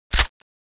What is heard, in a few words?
clip in, weapons; csco; csgo; bloodhunter